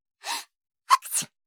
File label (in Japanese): くしゃみ